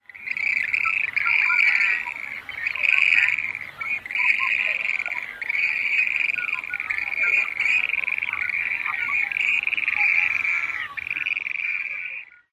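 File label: rapid metallic chattering, Western Grebe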